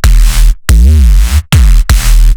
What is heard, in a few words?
D sharp, bass